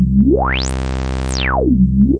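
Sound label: sweep, 202 synth construction kit; multisampled; synth keys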